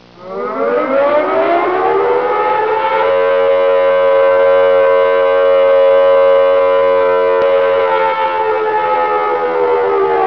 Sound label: air raid loop